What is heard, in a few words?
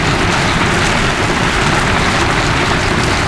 gravel roll wet, Tires; Kart; AOS RF1 Kart Demo